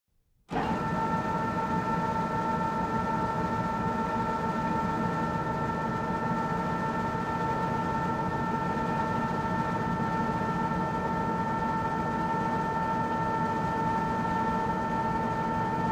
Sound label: Industrial Mechanical Large Conveyor On Start Run Stop Switch; Hardware